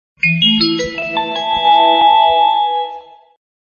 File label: iphone 13 pro max mensaje